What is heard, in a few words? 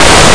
fridge